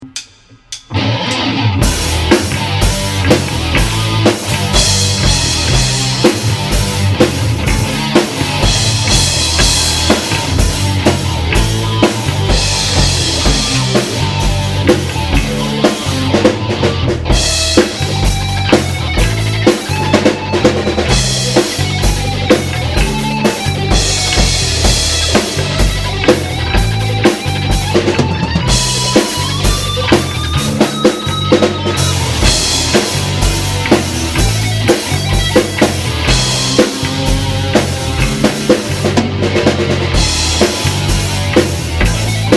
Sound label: Metal; rockbandacademy